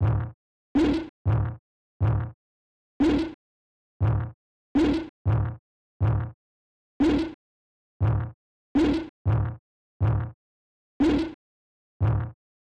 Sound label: Sinewave